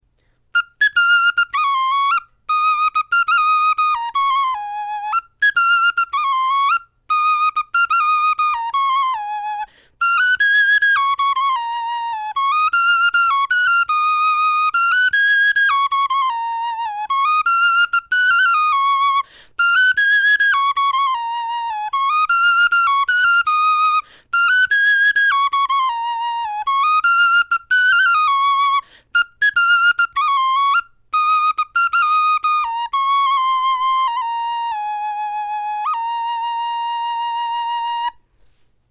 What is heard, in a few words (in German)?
melodie, knochenfloeten